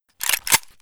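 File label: mp133 pump, weapons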